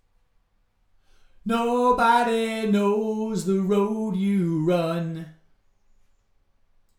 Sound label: vocals